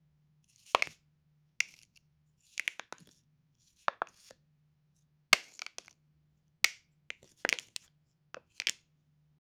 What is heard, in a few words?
picking up a small plasti